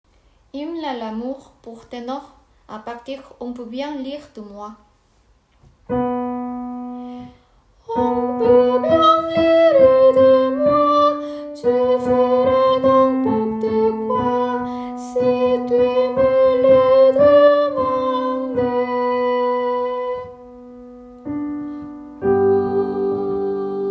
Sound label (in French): hymne tenor